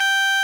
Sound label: RMI EP; Sonic Reality - Vintage Timetraveler